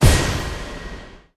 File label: Projectile Shoot; Hollow Knight; Mage Knight